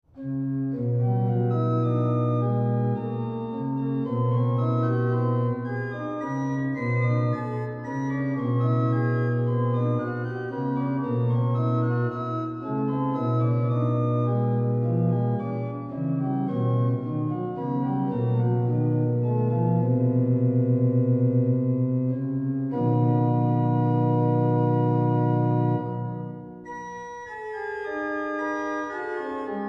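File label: bells, organist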